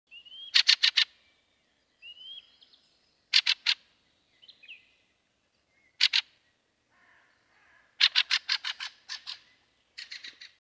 ryabinnik golos

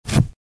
zvuk televizora

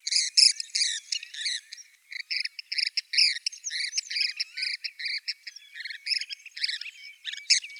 morskoy zuek golos

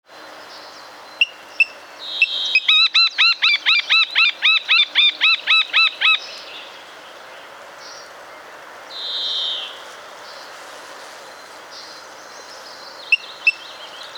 malaya poganka golos

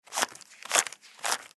zvuki deneg

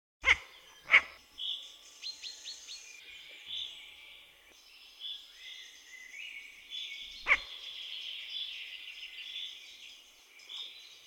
dlinnohvostaya neyasyt golos

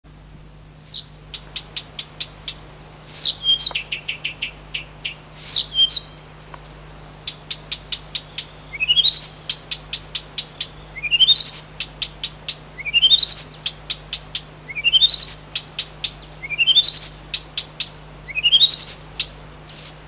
sadovaya kamyshevka golos

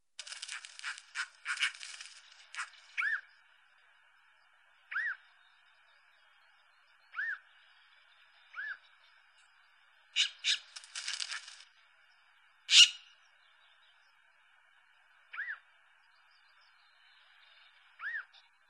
kuksha golos

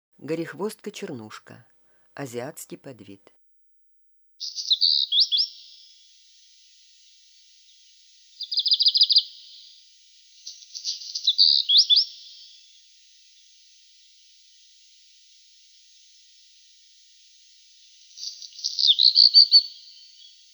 gorihvostka chernushka golos

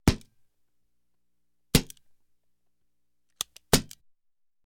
zvuki strelby

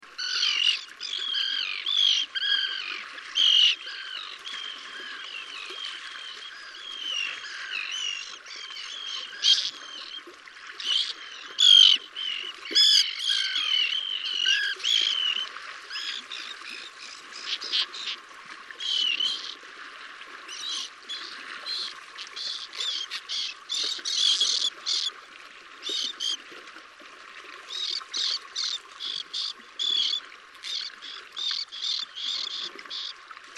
rechnaya krachka golos

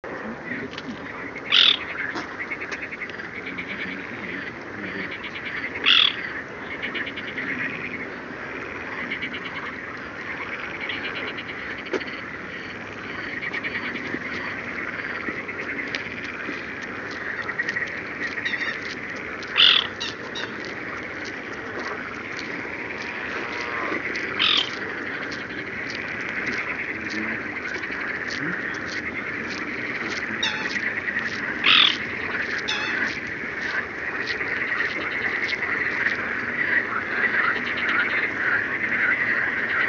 pogonysh kroshka golos

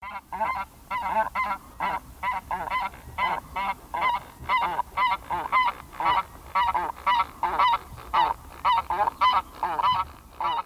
gumennik golos

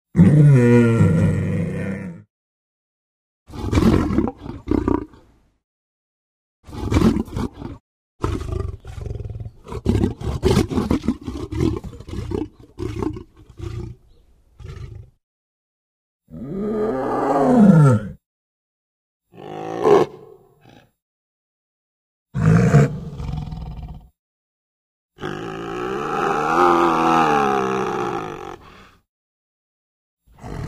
tigr golos

